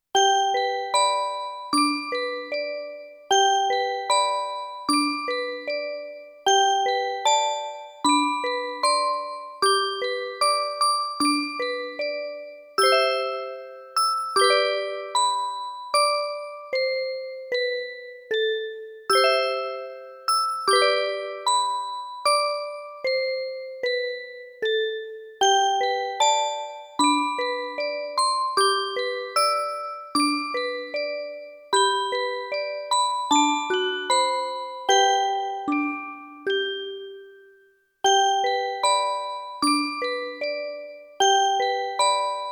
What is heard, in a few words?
orgel